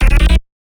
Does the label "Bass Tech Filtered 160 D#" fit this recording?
Yes